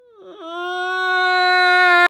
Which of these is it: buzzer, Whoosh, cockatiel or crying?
crying